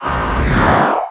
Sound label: Sound Magic ZAP